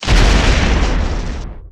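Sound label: Explosion Large; SFX Kit; Destruction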